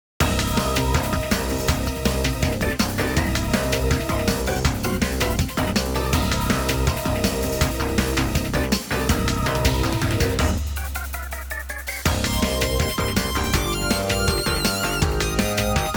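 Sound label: Battle loop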